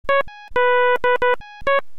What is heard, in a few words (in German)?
noten morse